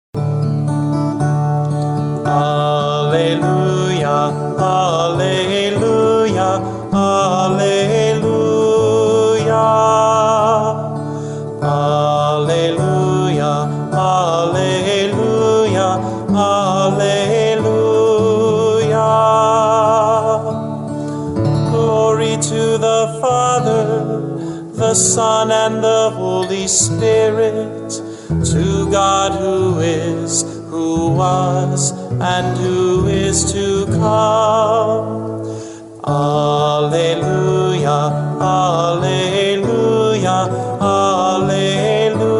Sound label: Gospel Acclamation; Trinity